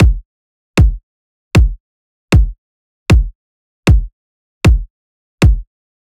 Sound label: Deathstep Kit 155bpm Kick; EDM Drums; Ghosthack; Drum Mega Bundle Demo; Ultimate EDM Drums; Deathstep Kit 20